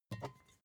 bottle vodka pick up grab hard; berufe; gastronomie

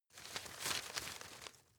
grab rustle soft; berufe; foerster

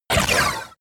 sci-fi laser shot short; krieg waffen

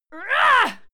female fight attack hit; schreie; menschen

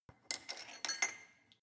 weight bolt insert, fitnessstudio; sport freizeit

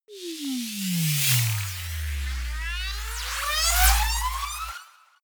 high charged passing fighting racer

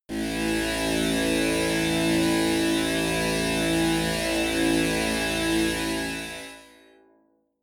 drone roar bass; drones

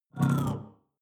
sci-fi alien big bionics; swooshes-swishes

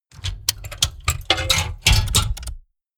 mechanics medium movement; industrie wirtschaft; mechanik

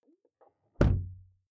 car door close interior hummer h3; Cars

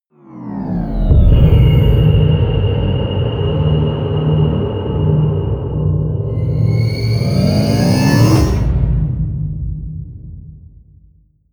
promo pad; whooshes rises

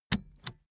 car seatbelt fasten hard

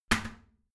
toilet seat lid slam heavy; haus-garten

